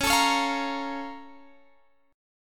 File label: A sharp Minor Major 7th